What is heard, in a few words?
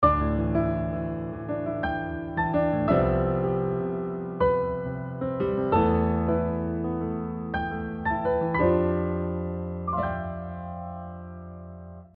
pandiatonic